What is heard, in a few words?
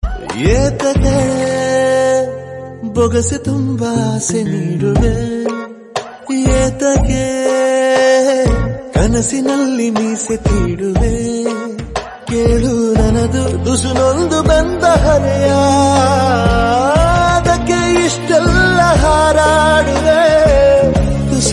Kannada Song Ringtone